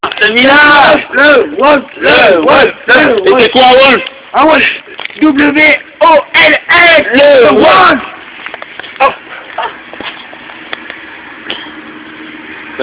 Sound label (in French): Imitation du WOLF; Imitations du Wolf